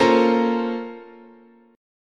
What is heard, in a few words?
Asharp Minor Major 9th, chord, guitar